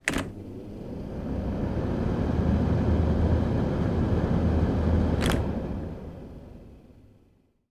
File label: household fan on run off